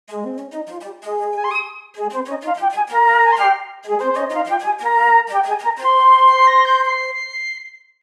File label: Video game success victory win flute